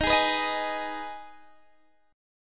E flat Suspended 4th Sharp 5th Guitar Standard, chord; Ebsus4#5 strummed; JGuitar